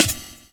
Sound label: Jazz Break